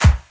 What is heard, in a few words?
vintage deep pop snare sound c sharp key, Royality free steel snare drum sound tuned to the C# note